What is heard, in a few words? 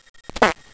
fart